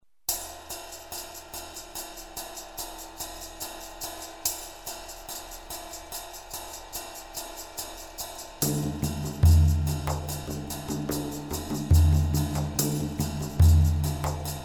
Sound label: percussion Slow